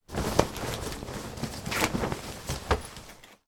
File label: toy childrens pop up fabric play tent fold up